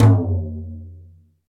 short reverb tom drum one shot f key, Royality free tom drum single hit tuned to the F note; tom drum samples; one shots